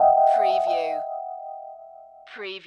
Hip Hop Dark Rhodes Licks 90 BPM Straight - E

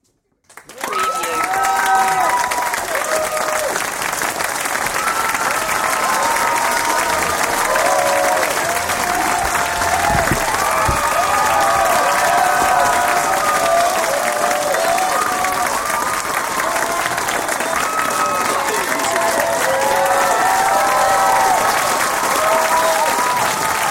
Big Crowd Audience Applause; beanstalk audio

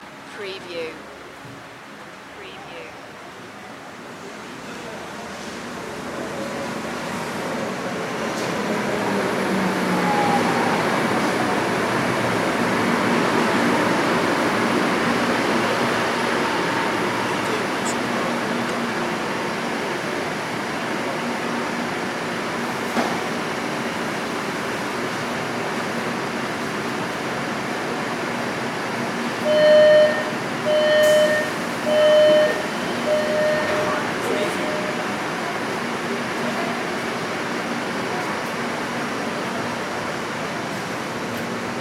kuala lumpur train station loop

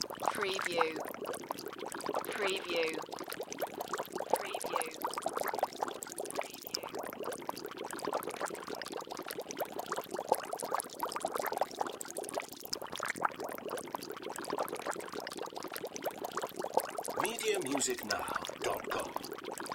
Potion Bubble Water

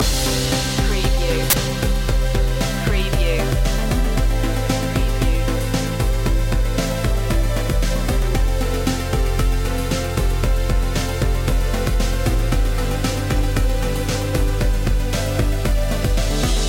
future technology loop

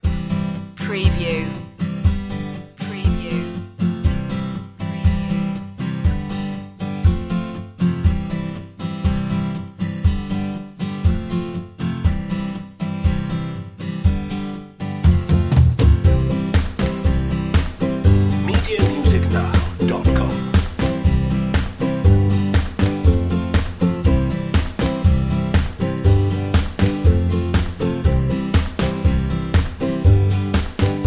whistle happy underscore

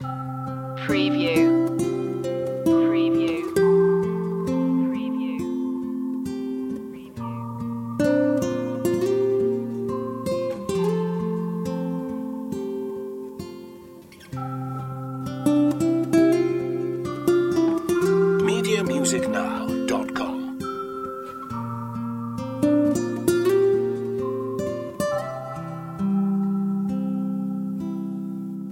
light atmosphere loop

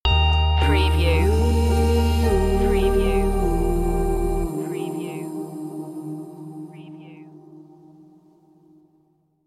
indie daze stinger

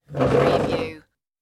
move over chair

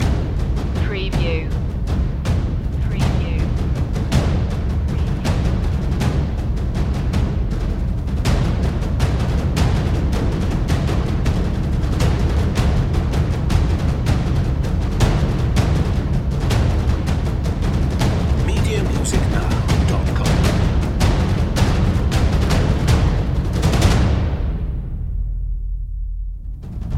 jungle ritual loop

